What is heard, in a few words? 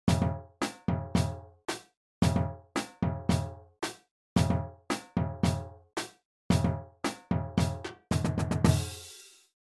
Easy Groove, beats; DRUMLINE - SIDELINE BEATS; Sideline Beats - Book #1